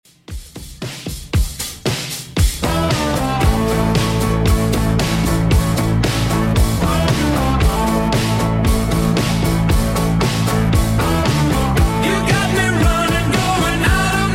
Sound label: 70s Redrum 115bpm